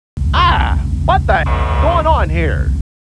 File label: buzz